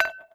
Panel Dressup Glasses; Among Us

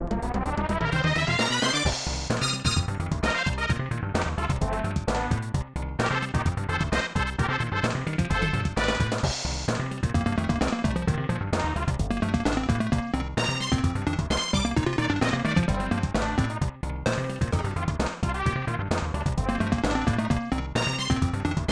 Battle; Heisei Gunjin Shougi; SNES

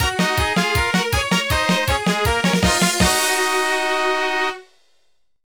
Battlestart; Break Tactics; DSiWare; NDS

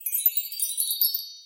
healing; Arcane Quest; android game

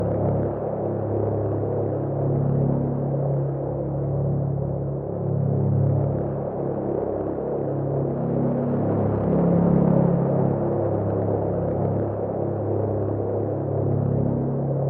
Void Drone; AER Memories of Old; macOS; PS4; Windows; Xbox One

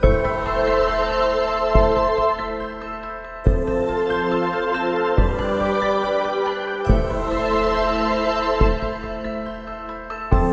Bgm Sad; Blade Strangers

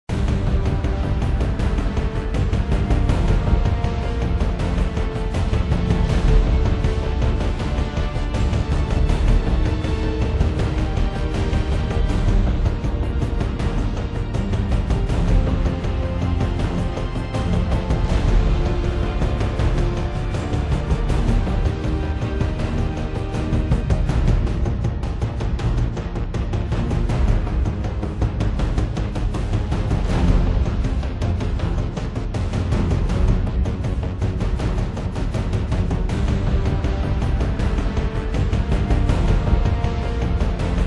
Victory; Space Engineers

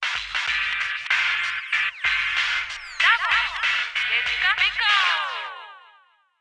Radio Jingle

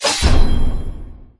Click Power

